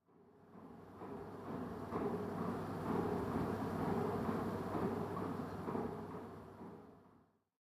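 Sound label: loop ambience; Pitch Black - A Detective's Demise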